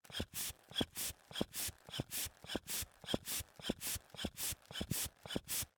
Hand bellows, leather, wood, brass, pumping, wheezing, blowing, supple, slow, wide; Eiravaein Works; Vaeyan IV